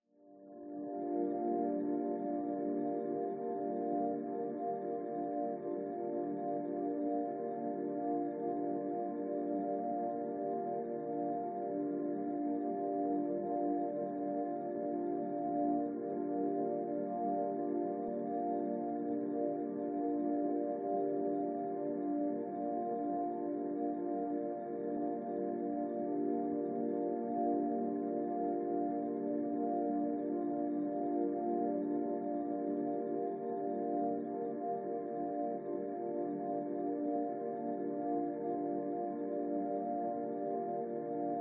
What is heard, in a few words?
sound design drone tranquil calm chords mid high freq; zapsplat